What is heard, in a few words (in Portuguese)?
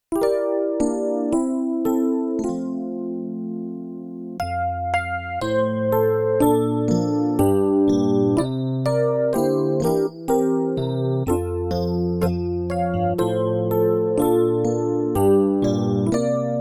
playback hino